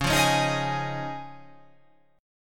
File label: C sharp Major Add 9th